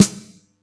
Yamaha EX-5

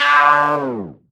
Misc Short FX

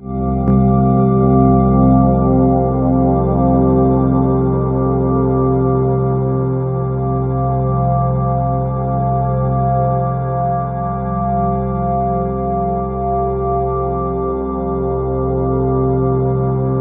HI ORGAN, Ominous Drones; USB Soundscan vol.48; AKAI; MYSTIC

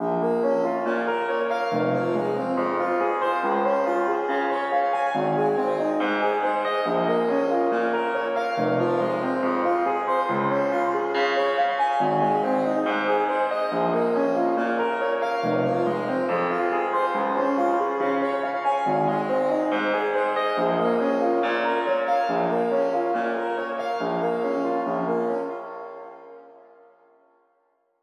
Break Arp Wet, 140 BPM; Vengeance - Essential Clubsounds Vol.5; Demo Constr Kits; Catch a Plane Kit; Root A#min; Synth

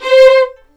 C5, VLNS ARCO; East West; The Ultimate String Collection

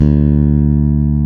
Basses, Roland

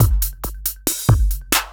percussion loops, Techno; PS-45

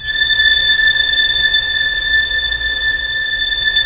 STR SYMST, Vls1 Sym; String Master Series; Vlns 1 Symph

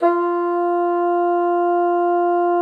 bassoon F4; Xsample VOL-6

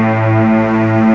LOW STR; LOSTRING; CMI Sound Library Rev 1.3

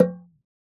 oxaca, percussion; ILIO

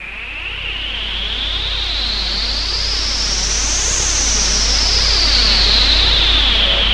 noise, production effects

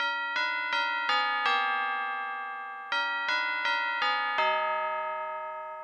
BEL, Hip Hop and R&B Drumloops; Best Service; ProSamples vol.01; AKAI

